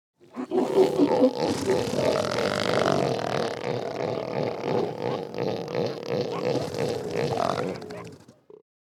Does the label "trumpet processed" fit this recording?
No